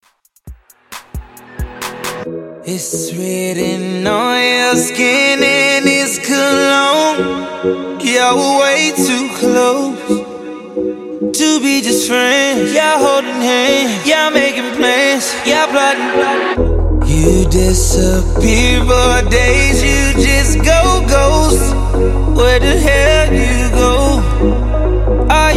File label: Dubstep Trap Redrum Intro; Remixfreakz